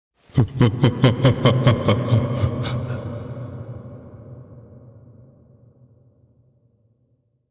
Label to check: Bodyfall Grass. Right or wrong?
Wrong